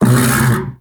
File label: Undazoa Vocal Tranq Grunt; Warframe; Hunting Critters; Characters